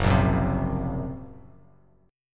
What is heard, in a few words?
F sharp Major Flat 5th F sharp Bass Standard; F#Mb5 strummed